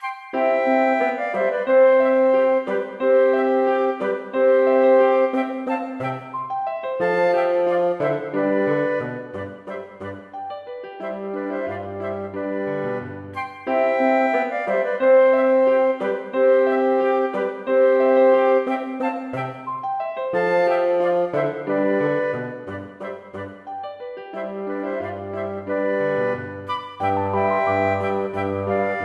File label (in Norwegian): Rigadon for to fløyter, fagott og piano